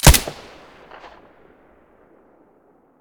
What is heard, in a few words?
silencer stereo